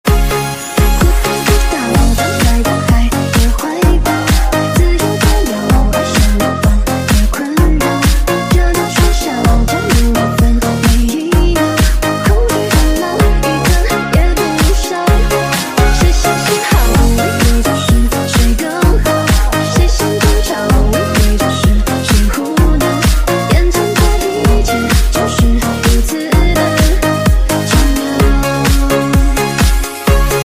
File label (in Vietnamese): Remix nhac Trung Quoc